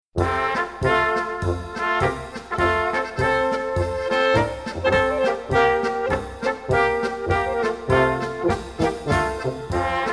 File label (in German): Polka